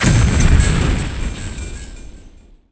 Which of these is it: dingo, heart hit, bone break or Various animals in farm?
heart hit